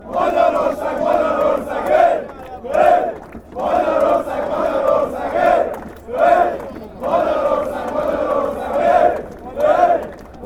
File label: hungary chant